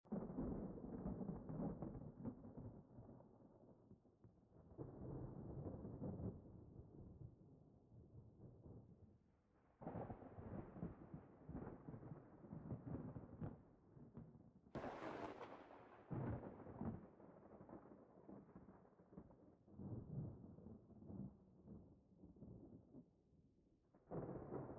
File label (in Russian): grom molniya